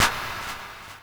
normal hit clap; osu

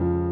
piano low

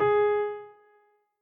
Piano

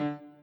chord in d

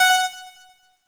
normal hitwhistle

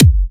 soft hitwhistle; osu

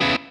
C#m7 Staccato

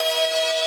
synth main

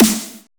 snare

